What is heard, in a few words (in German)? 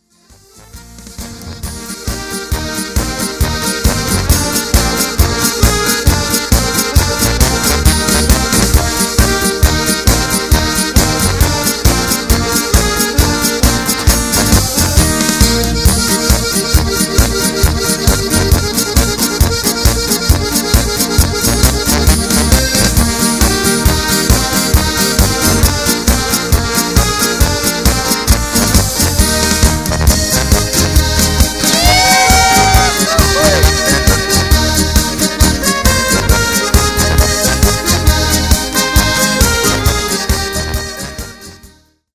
Trompetenecho